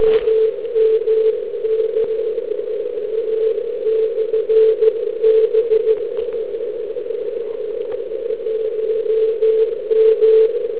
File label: Beacon; 3579.88 kHz OK1MKX/B; aktivovaný východem a západem slunce - 1mW Windom (okno vysílání jen 45 min) JN79JU; MIB